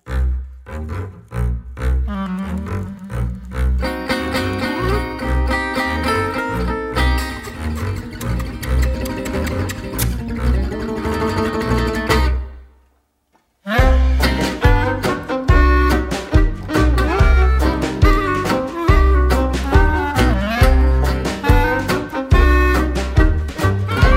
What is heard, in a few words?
Freilach, Swing Klezmer Orchestra